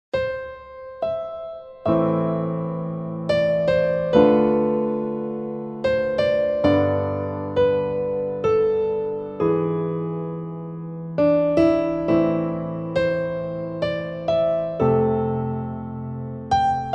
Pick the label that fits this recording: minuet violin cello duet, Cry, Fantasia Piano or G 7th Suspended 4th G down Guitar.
Fantasia Piano